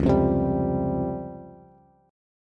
C sharp Major Sharp 11th, Bass Standard; C#M#11 strummed; 9,8,10,12; JGuitar

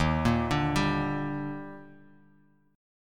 Eb Major 7th, Bass Standard; EbM7; arpeggiated; JGuitar

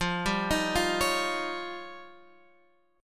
F 7th Suspended 2nd Sharp 5th

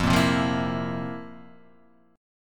F Major 7th Suspended 4th; FM7sus4 strummed; JGuitar

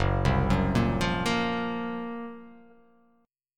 Gb Major 7th Flat 5th, GbM7b5 chord; arpeggiated; JGuitar

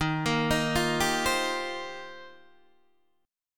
D sharp 6th Add 9th, Guitar, DADGAD

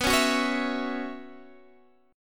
Gb 7th Suspended 4th B

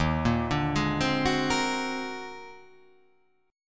D sharp Minor Major 13th D sharp

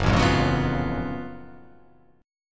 Gb Minor Major 11th Gb down; GbmM11 strummed; JGuitar

